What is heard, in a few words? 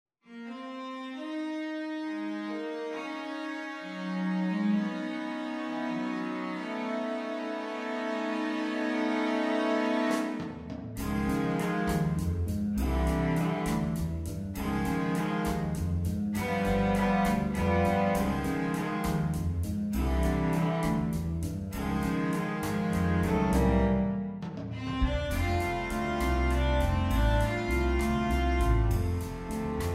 cello